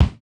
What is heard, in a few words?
kick, house